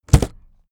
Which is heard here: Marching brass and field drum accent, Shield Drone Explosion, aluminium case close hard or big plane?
aluminium case close hard